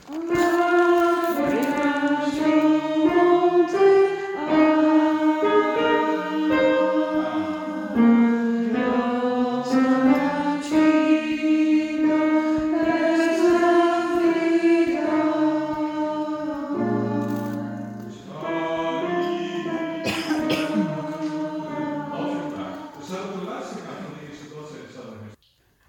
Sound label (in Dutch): El Nacimiento Alten